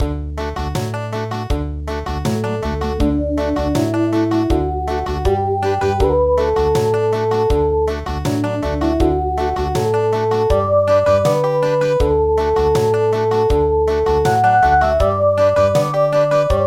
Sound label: Country, midi; SHIRAKUMO NABIKU